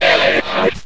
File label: scratch short, Vinyl scratches